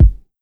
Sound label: Kick Neosoul